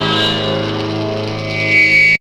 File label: Pluck; AMG